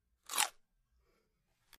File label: Bite Carrot Juicy